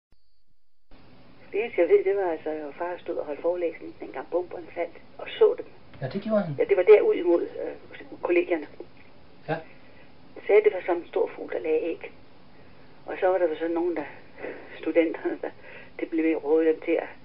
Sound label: bomb